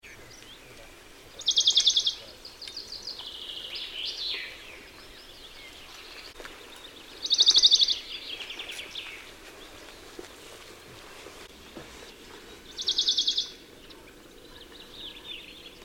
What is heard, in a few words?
Western Bonelli's Warbler (Phylloscopus bonelli); Porvoo, Emäsalo 4.6.2006, Finland; Linnut